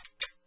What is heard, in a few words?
lewis sight flip; sven; ww2projekt